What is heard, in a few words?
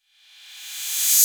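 808 cymbal reversed; Wizoo; Platinum 24 Electronic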